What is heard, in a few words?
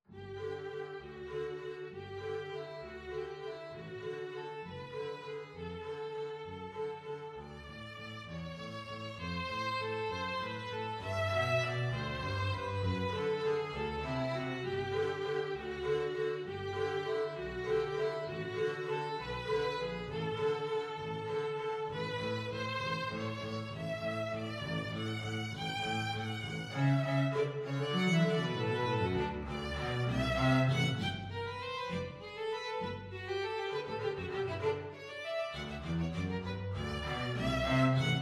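tchaikovsky waltz, violin double bass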